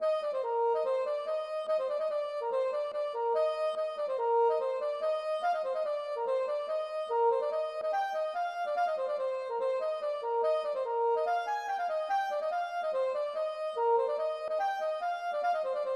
polka

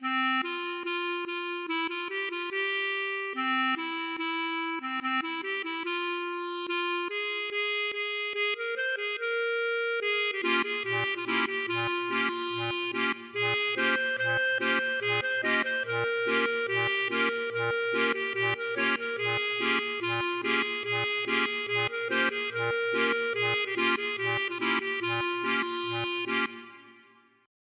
klezmer

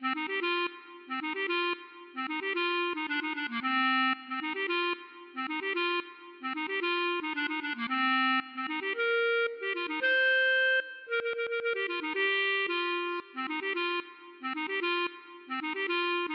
klezmer

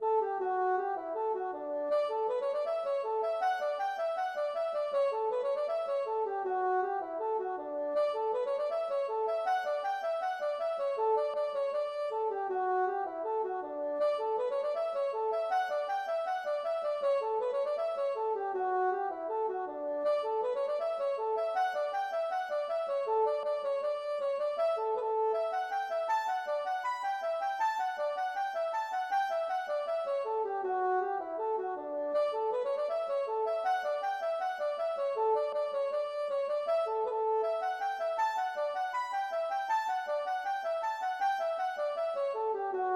hornpipe

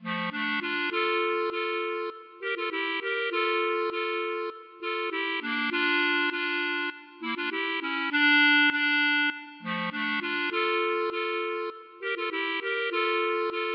klezmer

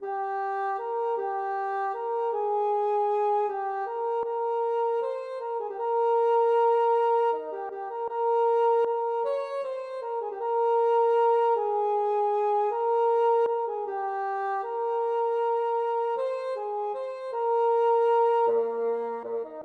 Tenor

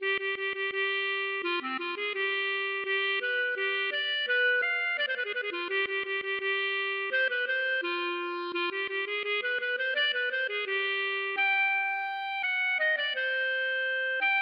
klezmer

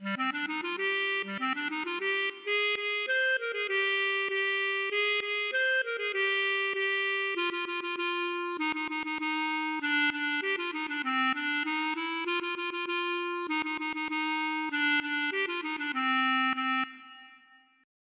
klezmer

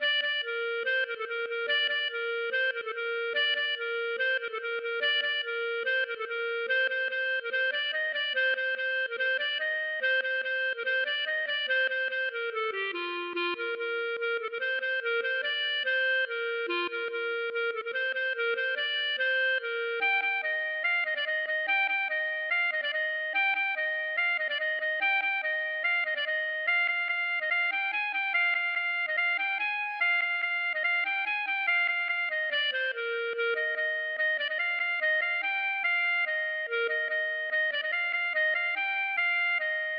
gavotte; Saint Renan

